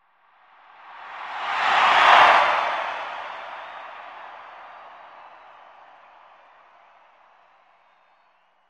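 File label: Arena Crowd Cheer; sports arena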